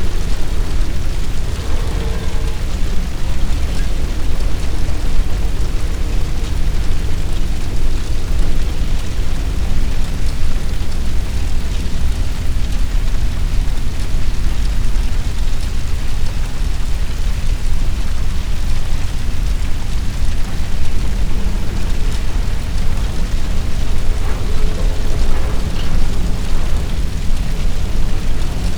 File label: ambience disaster fire